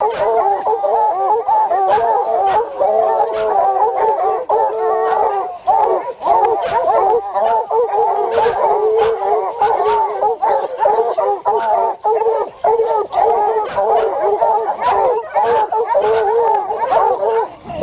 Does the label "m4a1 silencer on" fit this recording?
No